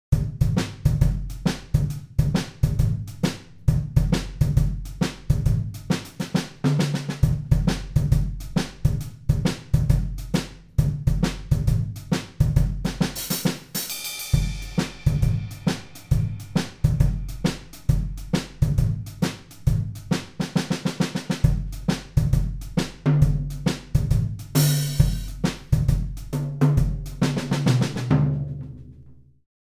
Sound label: Groove, drum-sets; Natural Drumsets; Groove #14